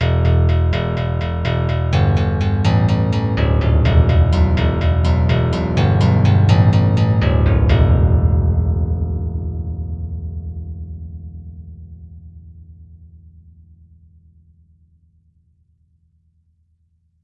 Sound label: steel string guitar bass